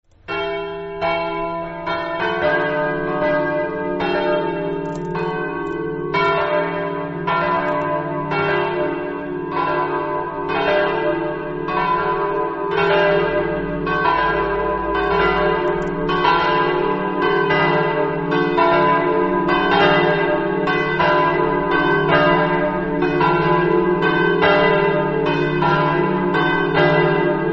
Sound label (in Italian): corno, campane